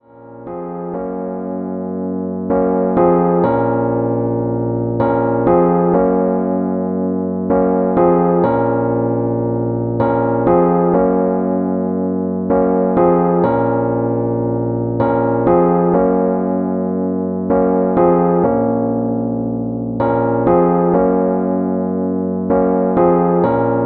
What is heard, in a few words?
Rhodes chord, hip hop chords; rhodes chord 05